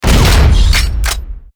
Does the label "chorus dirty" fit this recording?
No